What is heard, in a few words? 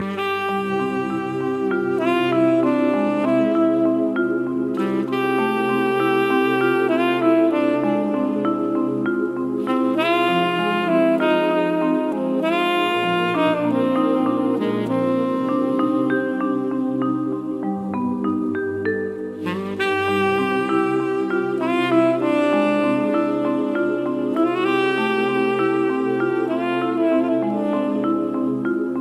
Saxo